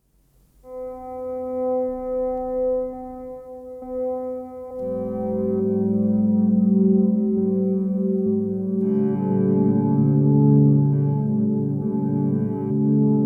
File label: Fender Bass; EQD; Rainbow Machine V2